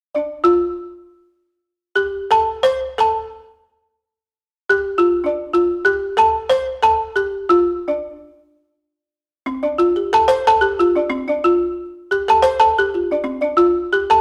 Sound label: Pulse Balafon